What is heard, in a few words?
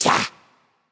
grunt jump; smite; ratatoskr